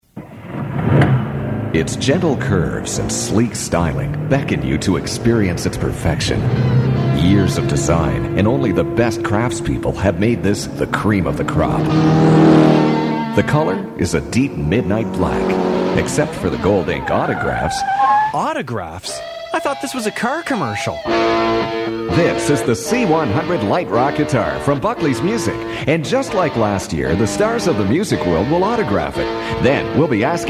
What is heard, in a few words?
Light Rock Guitar